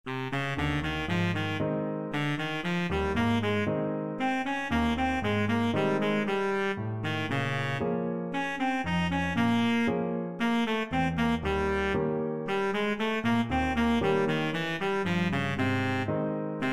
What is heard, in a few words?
baritone saxophone